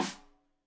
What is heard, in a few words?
Rim Shot Stereo; Drum; Deeply Sampled Drums; SMDrum